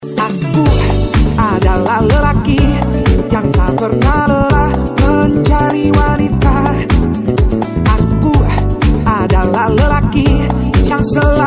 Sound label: jazz